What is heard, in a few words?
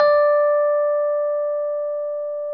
RHODES D4; Sonic Reality - Vintage Timetraveler